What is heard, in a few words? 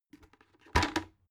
bucket empty put down drop